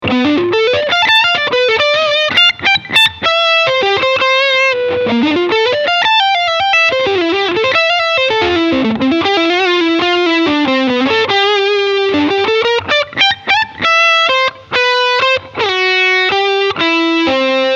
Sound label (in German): Klangbeispiel mit Boost